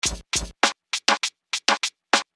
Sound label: Filter Groove